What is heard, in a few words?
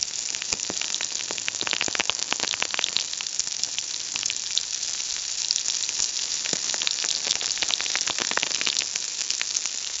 sound of formation of bur, lava